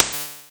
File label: spark; mechtower